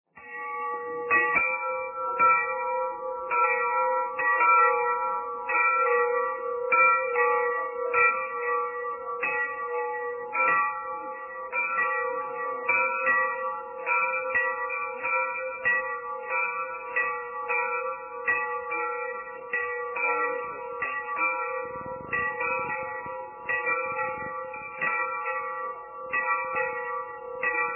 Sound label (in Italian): campane rosario